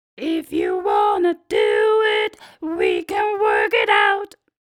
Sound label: growl; CVT